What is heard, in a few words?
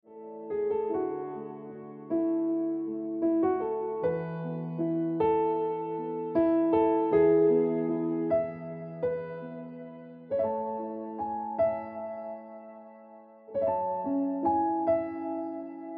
Happiness Of Waiting Ringtone Piano Music